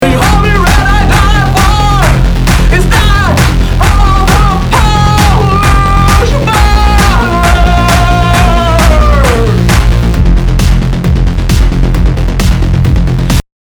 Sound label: Ringtone